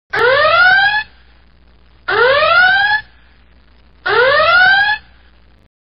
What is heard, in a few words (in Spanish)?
sonido de alerta roja efecto de sonido